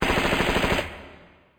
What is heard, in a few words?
shot; Monster girl quest Part1,2,3; Visual Novels